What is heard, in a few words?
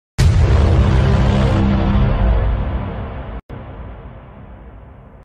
dramatic intro sound